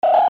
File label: scifi interface beeps; sound ideas